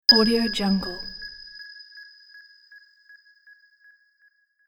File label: radar ping